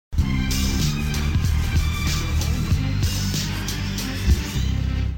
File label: Sms; Gta4